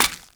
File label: Step Default, Foley Footsteps; Enemies; Warframe; Grineer Royal Jester